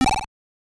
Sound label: hit goodie box or slider or stone; ducktales